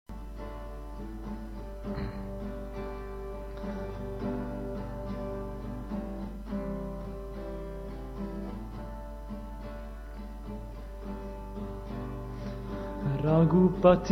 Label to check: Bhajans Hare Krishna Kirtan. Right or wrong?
Right